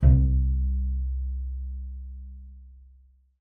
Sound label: C2, bass pizz; Xsample VOL-5